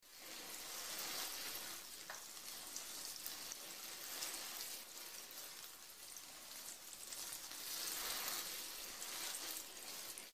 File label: gardening flowerpot; grade3; water source and conservation